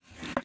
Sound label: selection reverse; ChromeOS Sounds